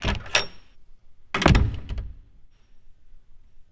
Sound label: Door Heavy Open Close; Home & Office; Sony Pictures Sound Effects Series Volumes 1-10; Sony Creative Software